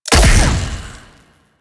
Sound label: Rifle Shot; Warframe; Weapons; Wisp Rifle